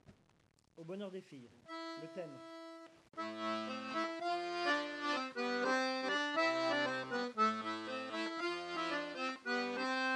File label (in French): Bourree Au Bonheur des filles Theme